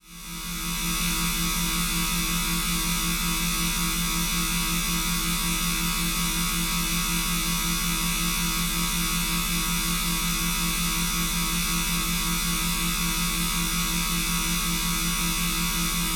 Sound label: damage; cub3d